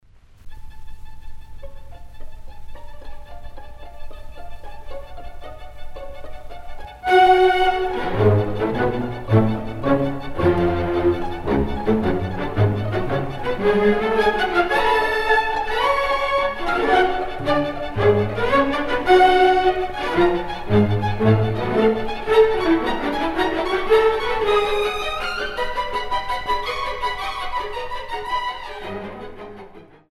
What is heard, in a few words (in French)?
Symphonie pour cordes Vivace non troppo; HONEGGER